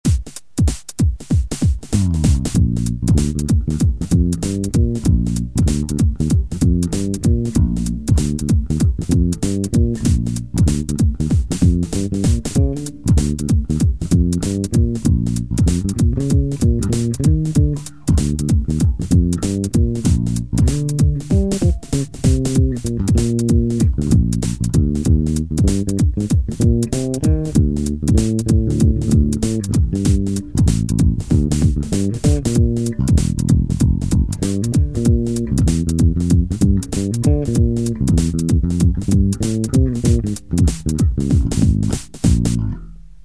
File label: chicken shuffle